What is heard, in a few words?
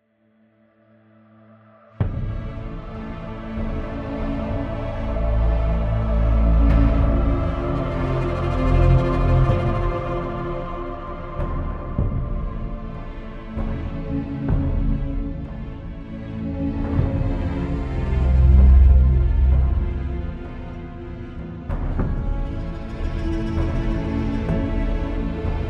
Loop Suspense